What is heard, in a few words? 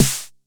Snare 909 Hard